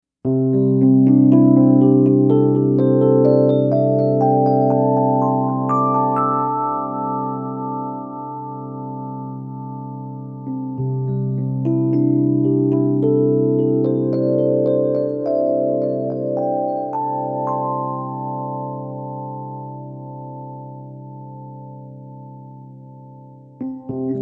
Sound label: music yoga